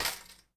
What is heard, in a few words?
Move To Trash